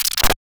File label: Crack Scratch; FREE SFX PACK VOL 2